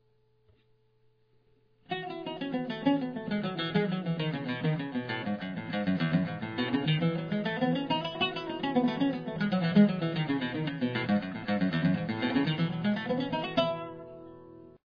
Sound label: rt hand ex E major, oud